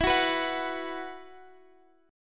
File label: E Suspended 2nd, Guitar Drop D; x,x,14,11,12,14